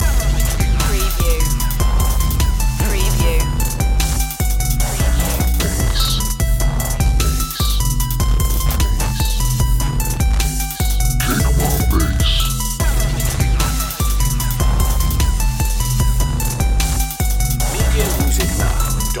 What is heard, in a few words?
kick my bass loop